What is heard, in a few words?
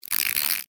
Scratchy Ripple; Sci Fi